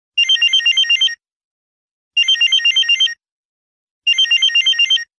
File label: mobile cell ringtone; vivo